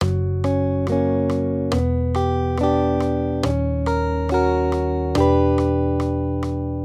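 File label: Accompaniment, Major Triads, Gb-G, 140 BPM, guitar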